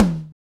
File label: TOM, Kit; AMG - Now CD-ROM (Roland); K.L.B. 3; K.L.B. Kit 2